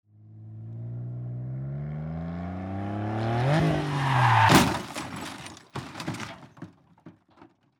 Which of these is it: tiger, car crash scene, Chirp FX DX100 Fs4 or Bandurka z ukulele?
car crash scene